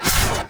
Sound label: Glaive Whoosh; Warframe; Glaive Ghost Catcher